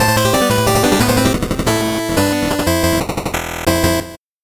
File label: 8bit jingle normal